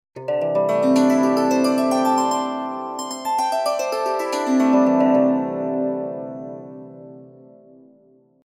swarmandal deshkar

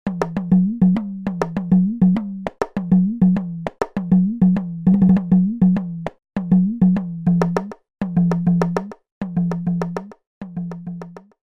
dimdi

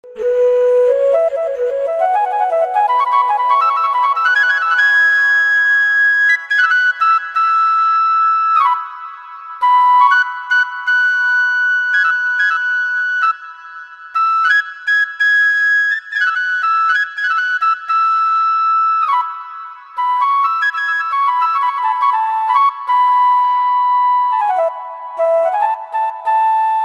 bansuri

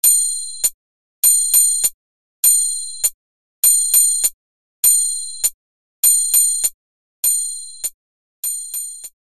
manjeera

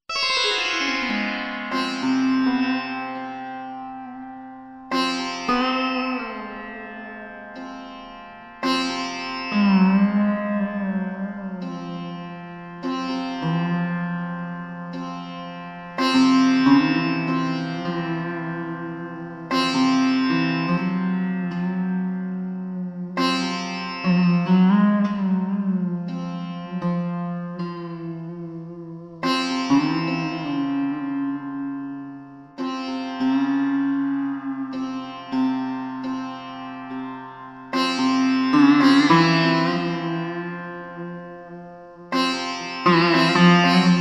sitar, lalit

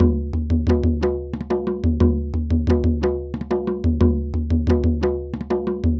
manipuri dhol

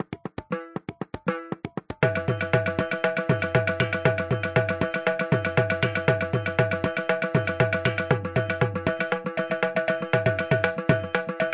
south fusion, groove

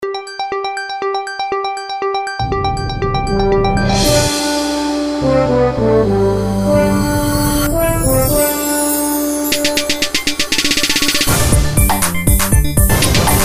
Symphony

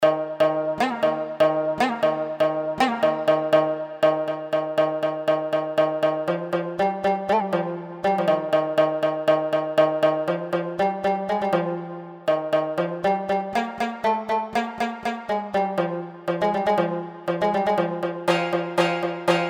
rabab

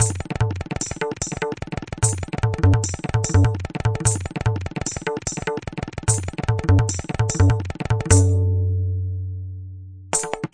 jhaptal, groove

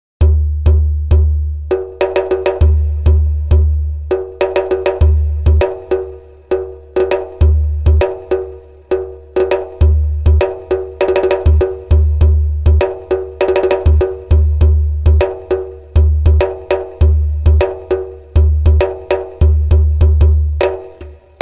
tumbaknari

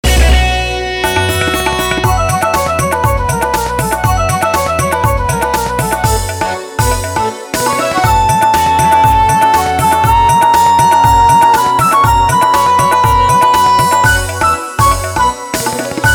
Studio3 Jingle

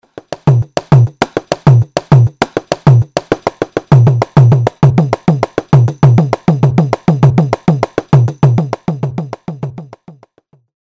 kanjeera; tambourine; Swar Systems

